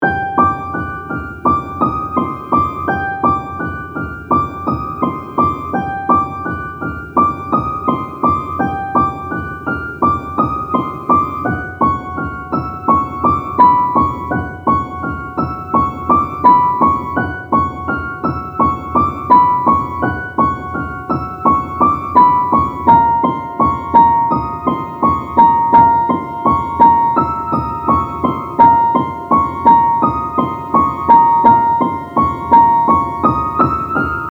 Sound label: Loop Pf BPM84, ピアノ・ベル系のシンプルループ素材; ループ素材３曲セット 2